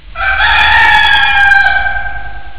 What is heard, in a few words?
rooster